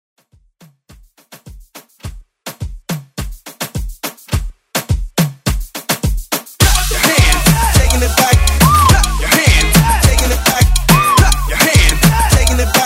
Moombah Mixshow Hype

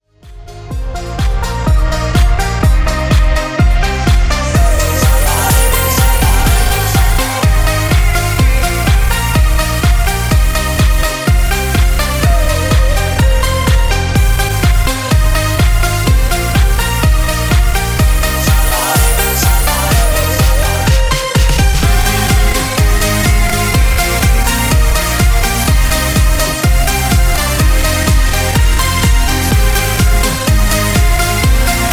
Mashup House 125 Bpm

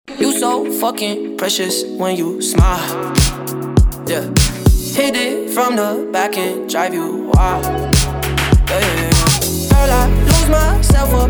Club Party Rework

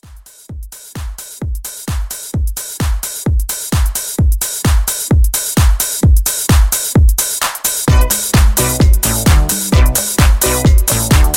70s Re Drum 130

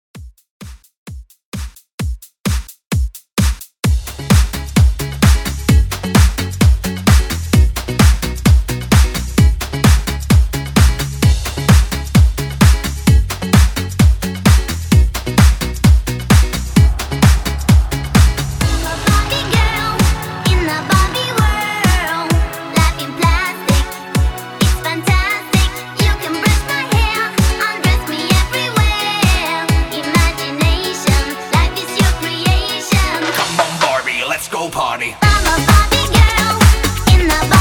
90s Dance 130 BPM; REDRUM

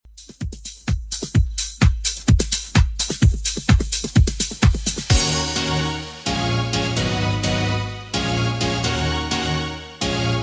90s Redrum 128bpm CLEAN

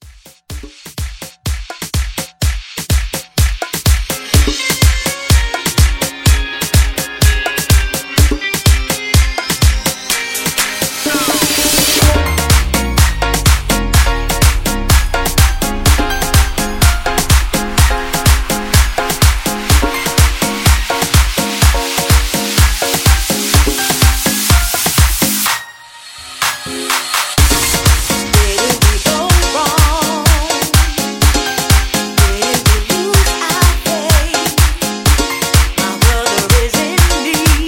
90s Mambo Re Drum 125 CLEAN

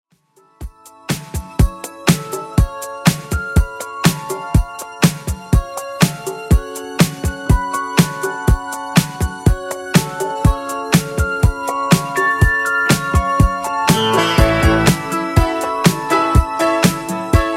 80s Redrum 122 bpm

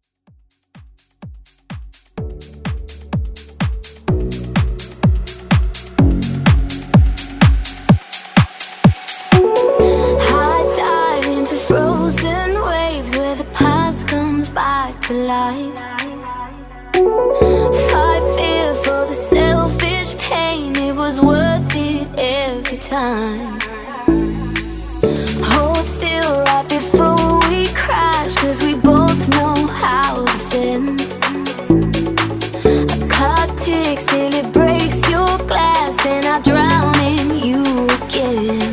React Mash Up

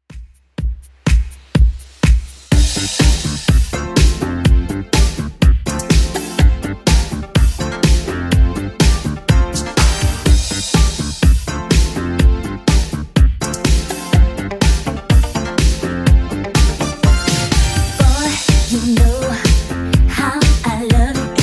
80s Redrum 124 bpm

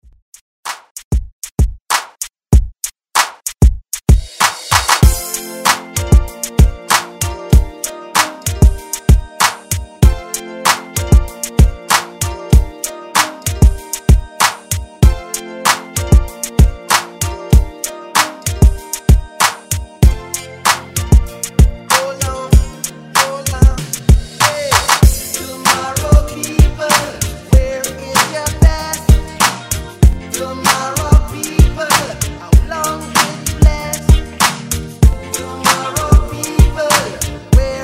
Reggae Ext Redrum

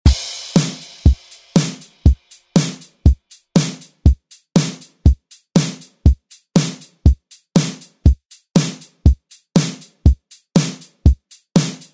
remixplanet

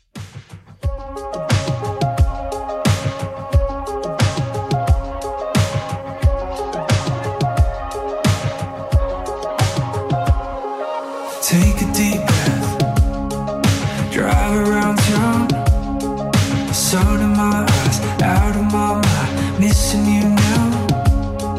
Top40 Redrum 89bpm

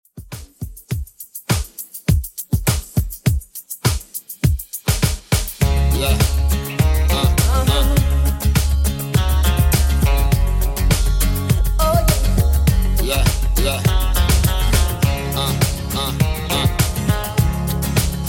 Reggae Pop Redrum 102bpm

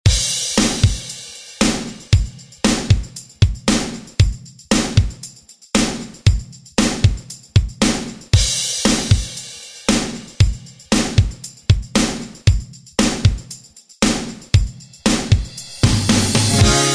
90s Re Drum; DJ Mhark

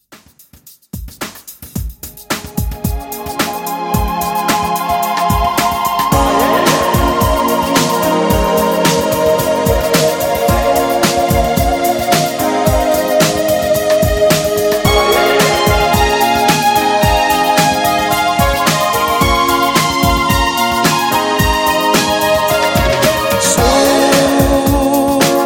80s Rock Redrum 110Bpm

